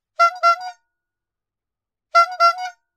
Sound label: Old Bicycle, notifications; Huawei C8813D